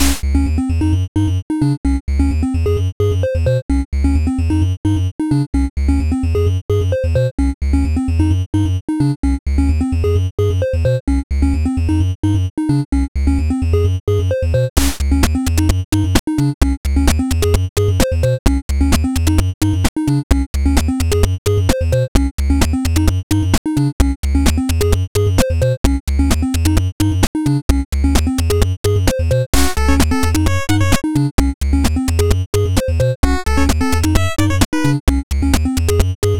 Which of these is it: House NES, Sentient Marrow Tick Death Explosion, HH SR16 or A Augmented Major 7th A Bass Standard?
House NES